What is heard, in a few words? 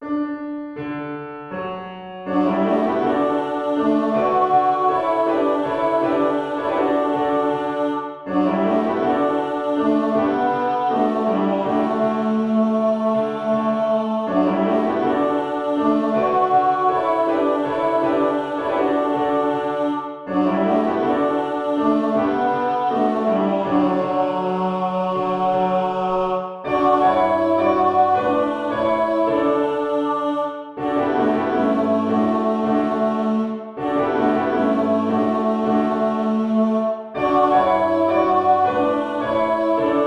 kom tenor